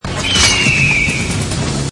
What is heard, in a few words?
chirp; lexx soundboard